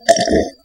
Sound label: Slurp